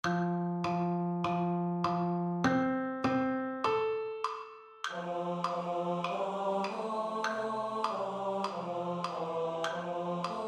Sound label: TENOR STUDY